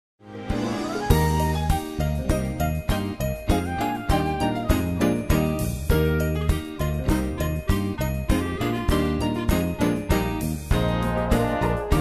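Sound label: karaoke instrumental deck the halls; christmas favorites; disney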